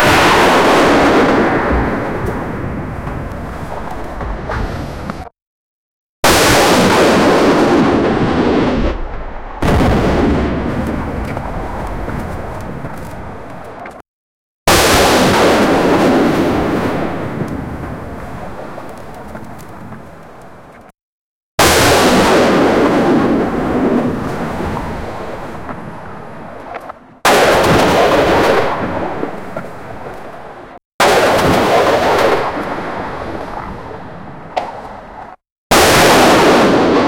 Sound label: the sounds of gunfire and, city